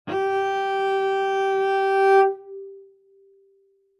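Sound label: Cello arco fortissimo sul D G4; MIS Pitches - 2014; Strings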